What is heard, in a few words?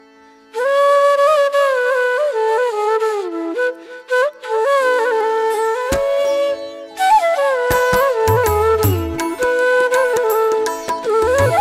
Instrumental Song Ringtone